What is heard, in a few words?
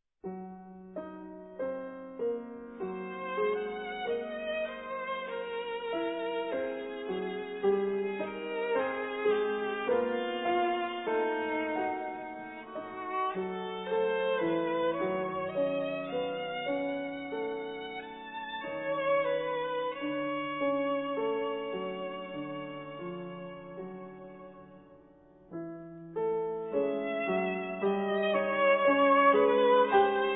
violine